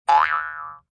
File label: spring boing